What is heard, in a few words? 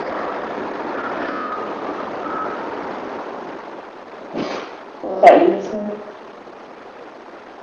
whistle; le bourgeois